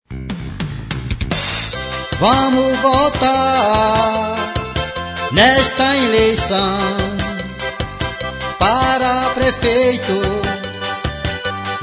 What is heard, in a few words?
jingles